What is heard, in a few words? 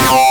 SBASS; akai; mpc1000; factorypresetsamples